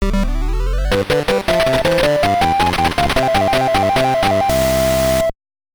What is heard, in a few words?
All Stages Clear; Bananan Ouji no Daibouken; nes; Bonus Island Clear